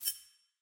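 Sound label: sfx social notification player leave lobby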